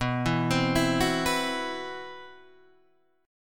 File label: C-Major Sharp 11th B, guitar